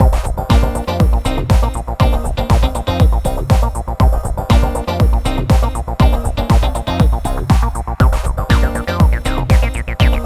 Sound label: Future House with drums